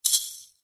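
musical percussion shaker maracas kids single shake; zapsplat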